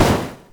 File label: Shell Bump Wall; New Super Mario Bros. Wii